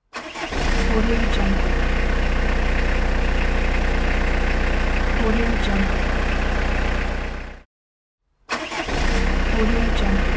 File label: diesel car engine